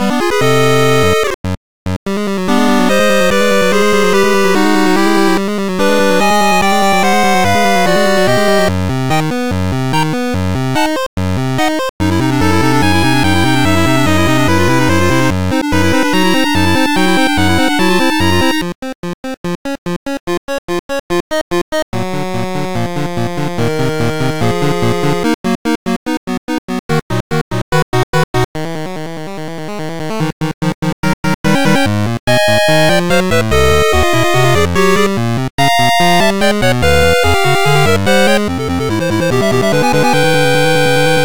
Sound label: 8bit Loop; mazieru shitou